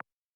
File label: Keypress Return; China Telecom